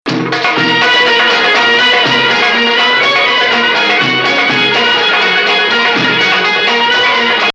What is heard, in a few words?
Husseini Nahawand; Husseini is the unresolved emphasis on 5 starting this melody; Husseini 5 - Nah. 4